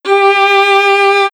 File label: Violin vib G5; dsp2008 sound library